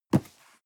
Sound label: cardboard box medium grab slide hard; objekte